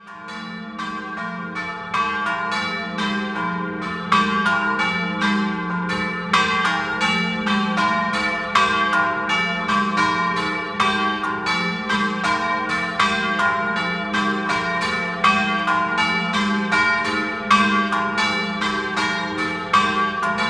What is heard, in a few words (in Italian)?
Mezzomonte, campane